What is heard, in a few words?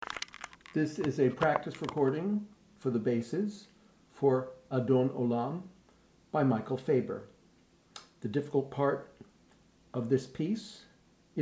bass; Adon Olam